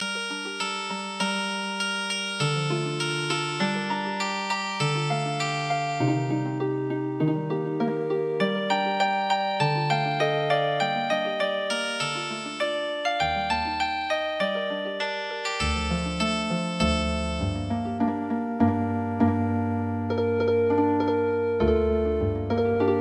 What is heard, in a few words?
yakko san Healing Harp